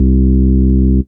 move, Bass

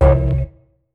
rhodes, Stabs

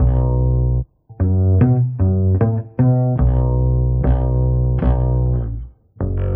bass

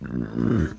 burp short

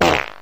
fart short

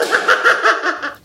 laugh; joker

